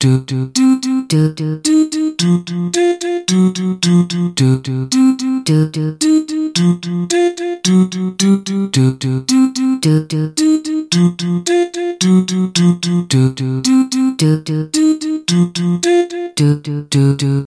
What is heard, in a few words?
funny ringtone; funny ringtones